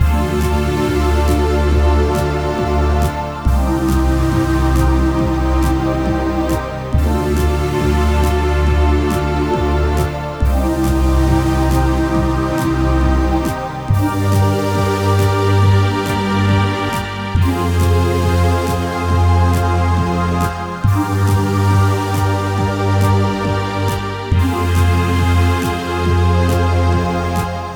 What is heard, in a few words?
Strange Game Menu, fantasy